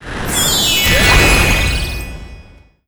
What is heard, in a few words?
Heart Meteor Fall; disney star smash android ios mobile gamerip 2020